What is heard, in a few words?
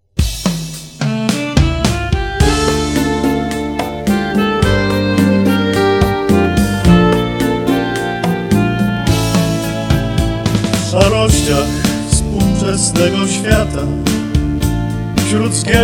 hymn